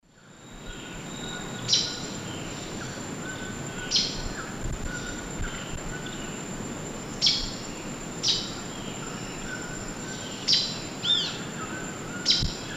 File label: Myrmotherula sunensis, calls; S. America; Eastern Lowlands